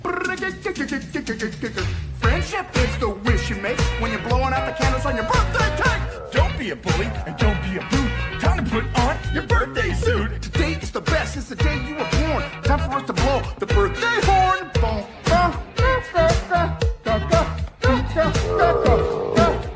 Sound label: Birthday Song